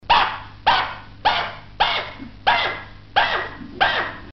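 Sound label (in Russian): звук шиншиллы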